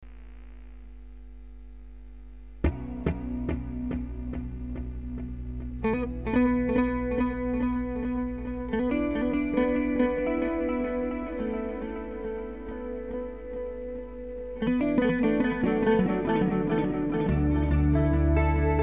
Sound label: Train